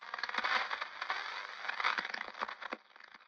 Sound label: static; Titanfall 2